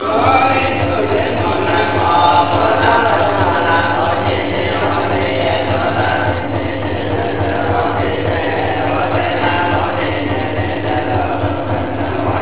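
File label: monk chant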